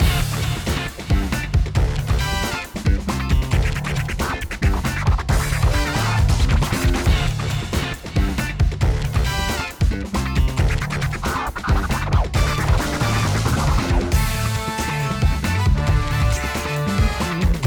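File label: Loop Battle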